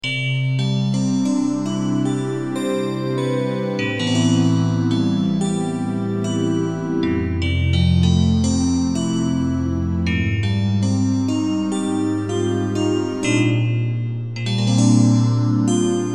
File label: Organ - Bells